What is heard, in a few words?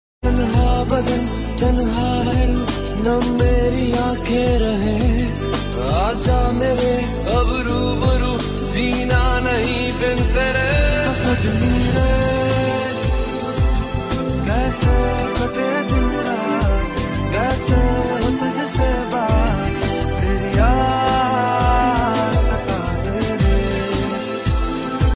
song ringtone; instagram